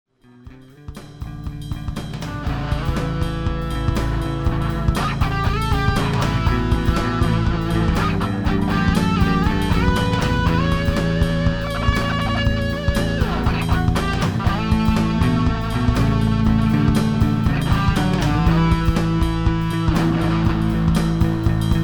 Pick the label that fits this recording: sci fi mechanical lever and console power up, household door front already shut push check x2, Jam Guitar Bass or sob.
Jam Guitar Bass